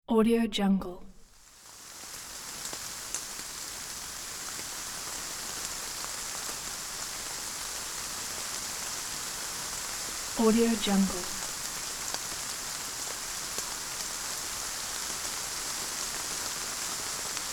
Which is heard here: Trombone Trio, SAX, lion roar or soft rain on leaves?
soft rain on leaves